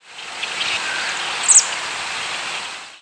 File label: American Robin; Thrush-like calls; thrushes